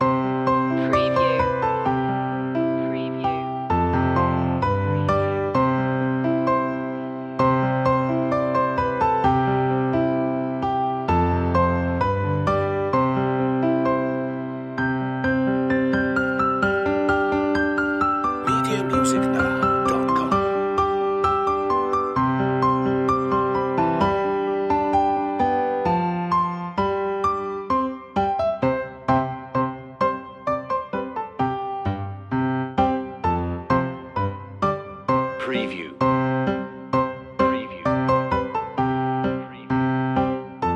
piano loop; ding dong merrily on high; media music now

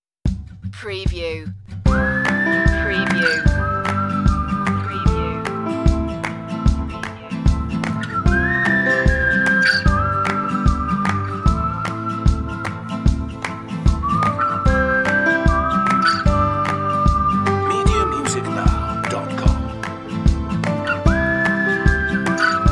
whistle song

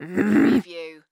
Grunt Female

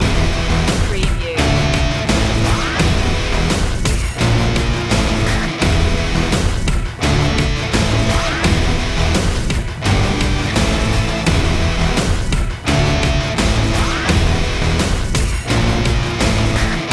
hip hop big cinema loop

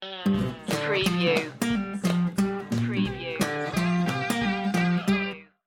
shuffle stinger

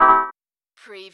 Servo Panel Sound - Transit Error

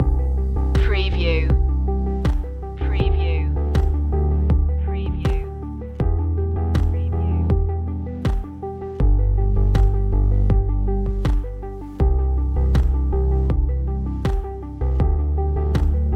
pavement loop

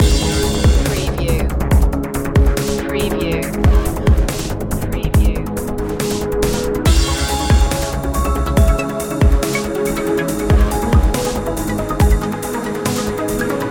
deep impulses loop

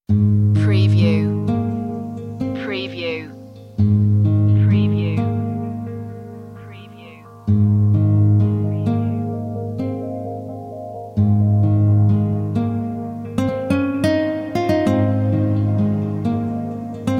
guitar blue

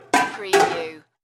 Double Pot Bang

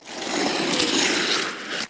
Snow and Ice, Ice or hard snow grate long

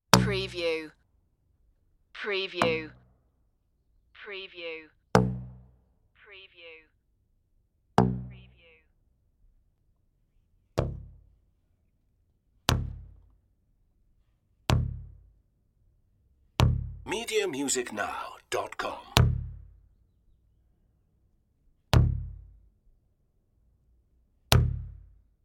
tube hits

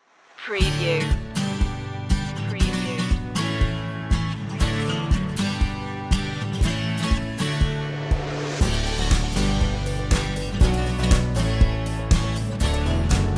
summer acoustic guitar

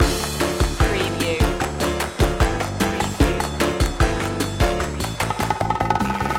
mambo jambo loop

